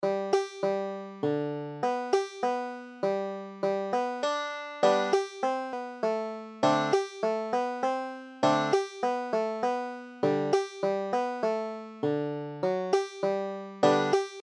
banjo

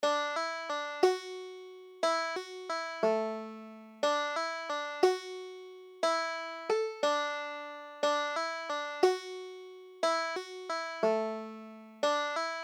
jig, banjo

